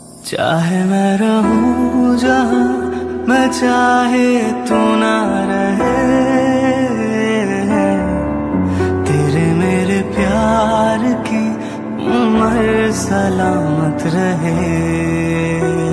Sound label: Most Romantic Hindi Song Ringtone